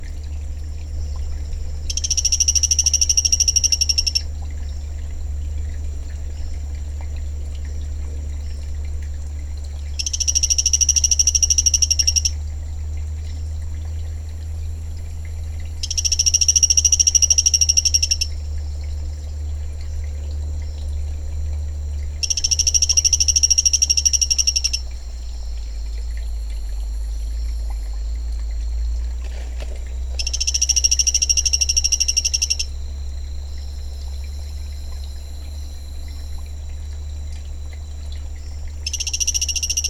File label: Hyloxalus nexipus